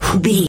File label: voice male breath quick